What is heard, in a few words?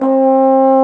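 BRS Bass Trombone, Solo; Roland LCDP06 Brass Sections; Bs.Trombones; Bs.Bone Solo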